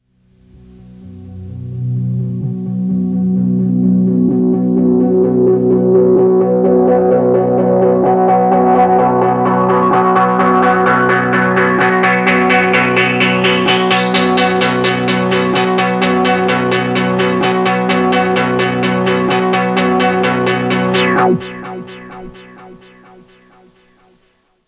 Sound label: Synthstack